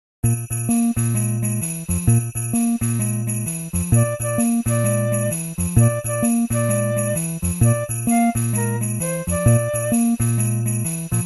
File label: Jingle Bells Jazz